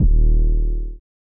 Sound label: 808 kick, d